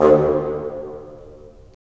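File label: WND BN, wind bassoon; LA Composer vol. 3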